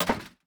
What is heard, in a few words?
Metal Box Impact